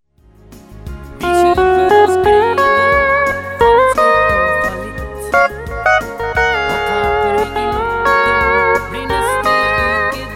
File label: steel